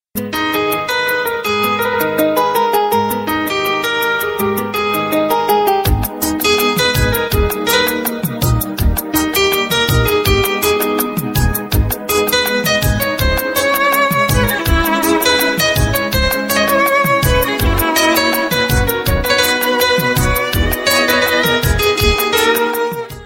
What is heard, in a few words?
INSTRUMENTAL RINGTONE